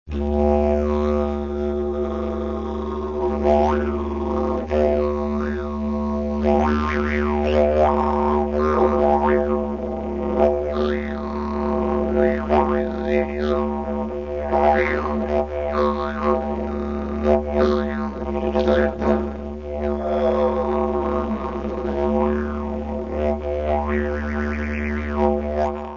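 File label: vocal modulations, didgeridoo; Timeless Productions; didgeridoo tutorial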